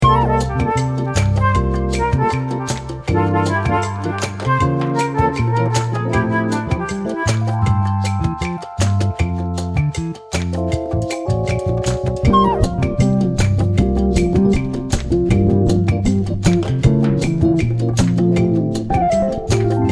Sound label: elevator